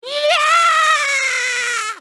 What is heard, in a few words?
eeyyaaaaaaaa